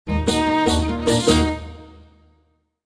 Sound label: Russia Music Short; Civilization Revolution